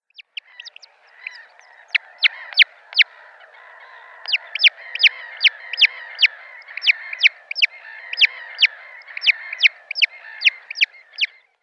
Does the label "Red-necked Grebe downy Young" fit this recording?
Yes